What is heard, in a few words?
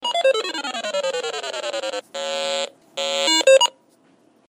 Laser Quest Tag